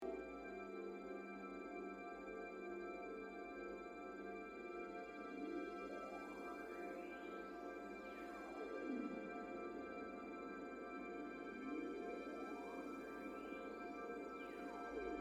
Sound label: Robotic String Stream From Brilliant Didji